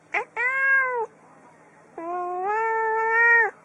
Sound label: cat